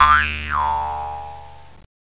Jaw Harp; Cheesy Lo-Fi Sound Effects

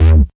sub blart, Basses

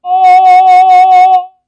synvox F sharp3, Synthesized Male Voice

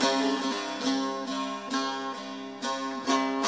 saz loop; WORLD LOOPS

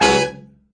c major punch, Horns and Brass

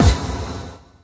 Electro FX; Ueb HE Electro FX

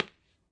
Taka, Tabla; ETHNIC and WORLD PERCUSSION; Tabla Kit Large

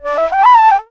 flute melody scale; MELODIC SAMPLES

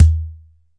ROLAND SPD-20 PERC TABLA

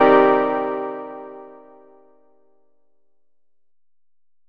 stab satisfying; Melodic Stabs and Hits

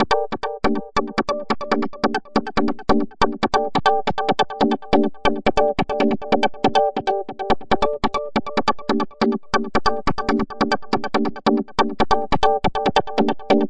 140 stacatto keys vamp; melodic loops; keys organ piano loops; blacklight

